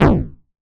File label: Cry